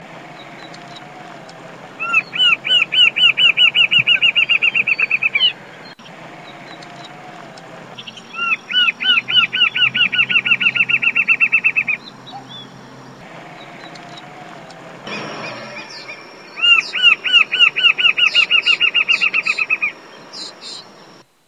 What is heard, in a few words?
Thamnophilus ruficapillus; Silveira